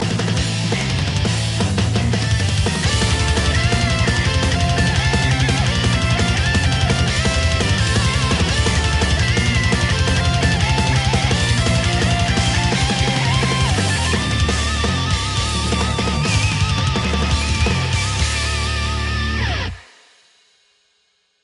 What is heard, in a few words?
metal